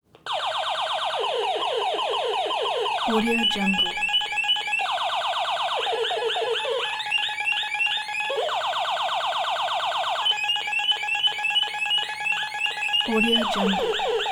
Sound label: toy ray gun beeping bleeps and bloops